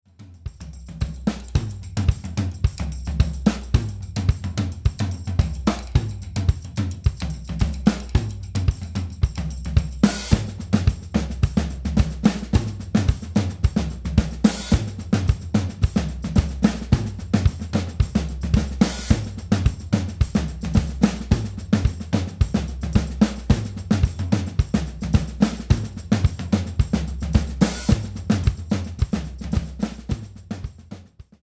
80s pop

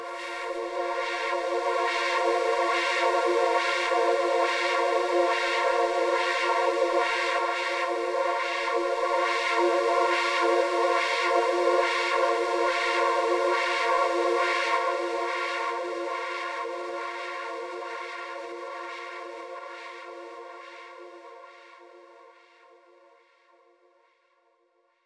pad tranquil

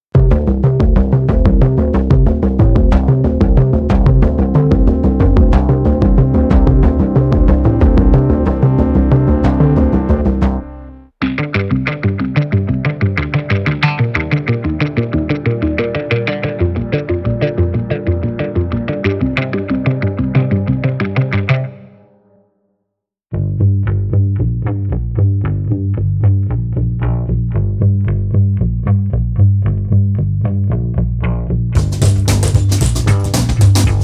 rootbass arps

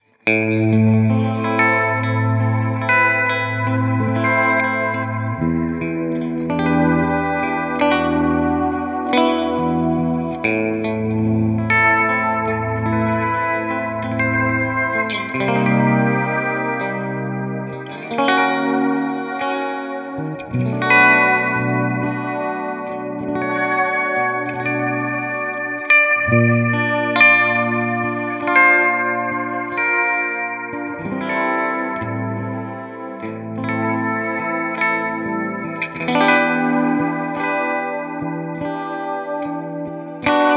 harmonic tremolo; malibu

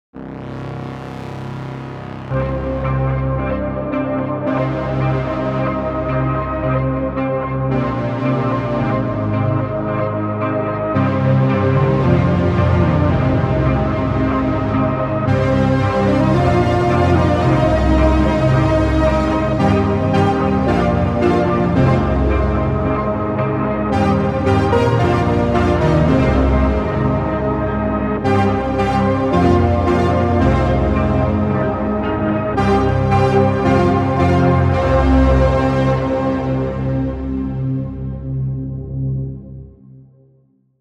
mystic places diva

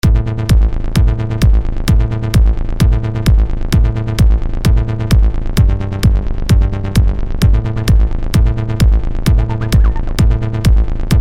simple trance bass

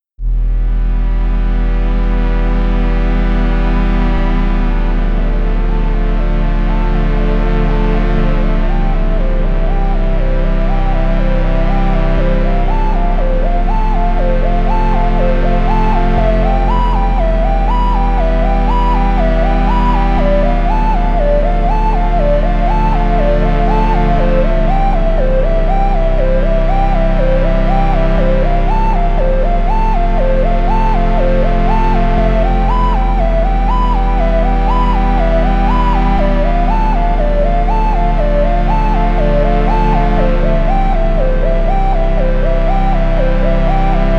analog waveforms

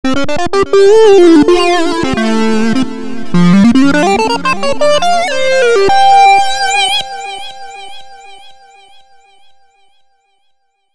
bulbous sync solo; pro12

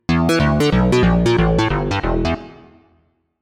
MKS80 bass; opxpro3

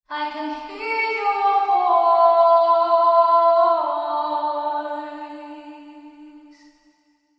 echo chamber cave reverb

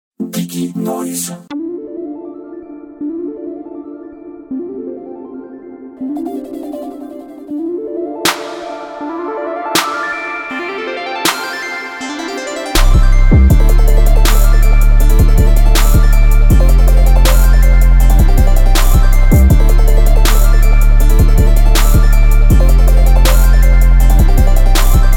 crazy arps; diginoiz

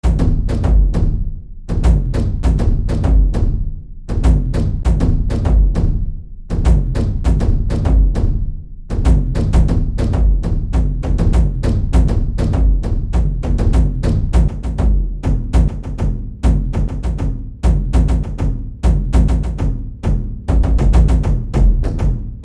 frame drummer

